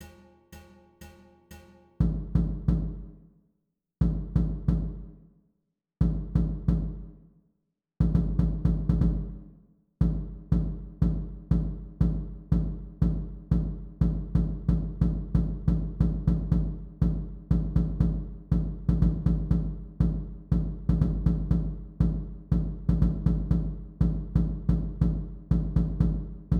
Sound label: jams (bass)